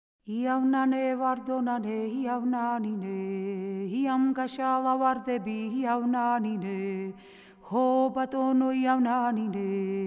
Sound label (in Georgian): iavnane moxauri samkurnalo; Mzetamze